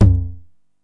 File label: TOM L, 909; musicradar-synth-samples; Classic drum machines; 909TOMS